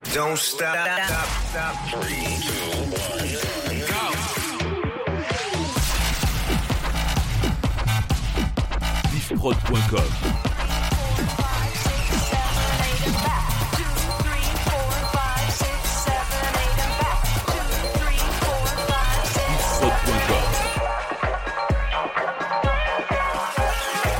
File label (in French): Medley Electro Classics 130 BPM